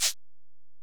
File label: Ac Perc, Drum Machines Alesis DM-PRO